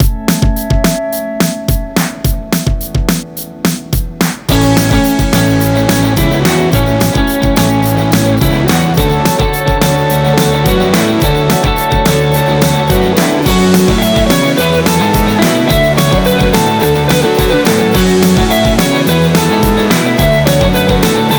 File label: Upbeat Indie